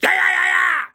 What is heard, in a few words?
yayayayyay